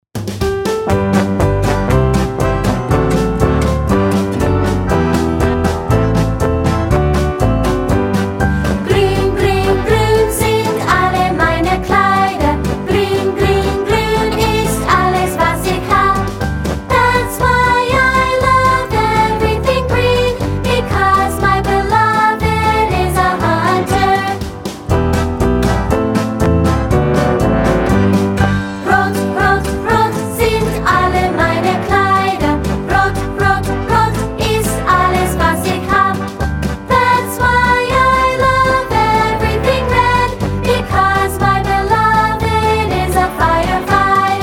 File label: Vocals